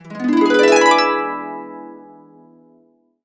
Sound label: E, Harps; Complete Harps; USB Soundscan vol.62; AKAI